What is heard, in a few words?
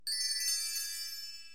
PUZZLE TIME BONUS; pat & mat game; MINIGAMES